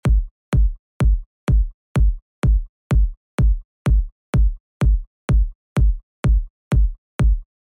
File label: kick progressive house